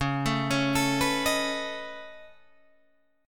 C sharp 7th Suspended 2nd Sharp 5th C sharp down Guitar Drop D